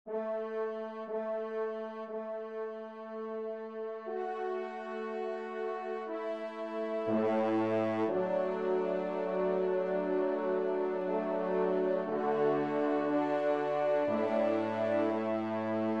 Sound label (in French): Cloches du Village, trompe; Emile Rolle